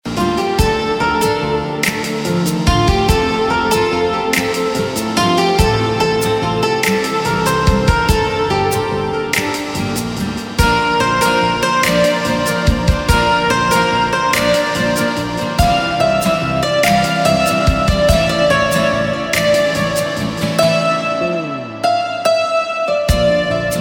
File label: Instrumental Ringtone; Bollywood Hits (2015)